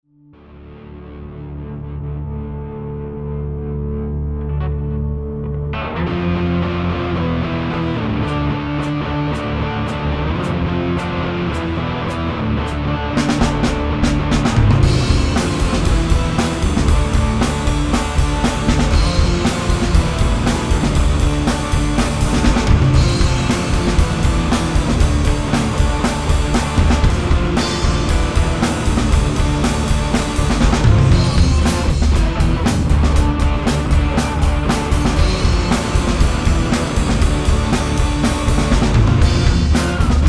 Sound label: drums